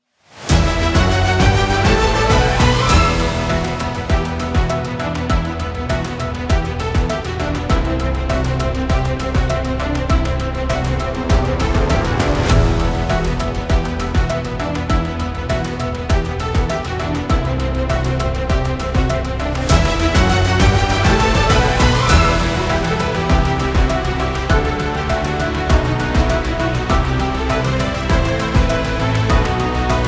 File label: Breaking News Intro Music